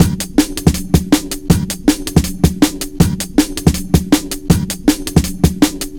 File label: 160, Drum Loops; Total Drum&Bass